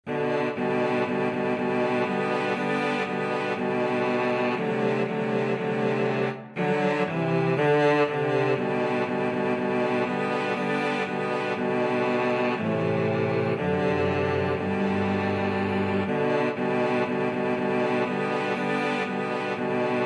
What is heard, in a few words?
cello quartet